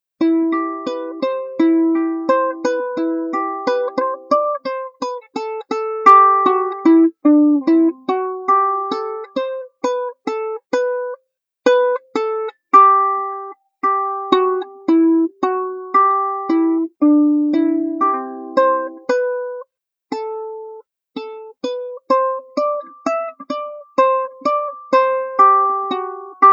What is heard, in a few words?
uke jam; weeklybeats-2014